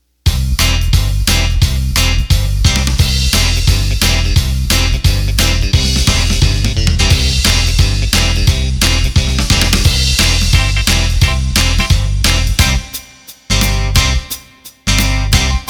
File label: Clock Rock N; roland bk7m